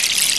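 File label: stream; sound canvas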